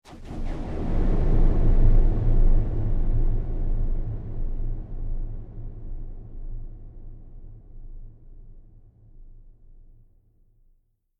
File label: Explosion Sweetener Sound Design Element; Warfare